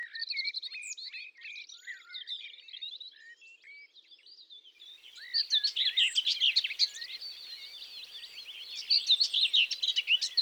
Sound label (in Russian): seraya slavka, zvuki prirodi